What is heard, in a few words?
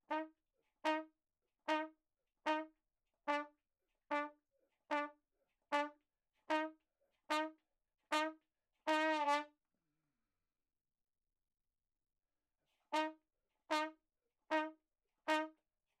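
RAGTIME trumpet room L 2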